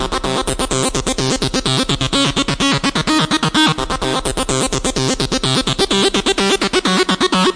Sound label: rip yer head off acid, synth and electronic loops